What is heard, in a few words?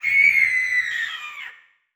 Title Transition Horror Dark; Adobe; Production Element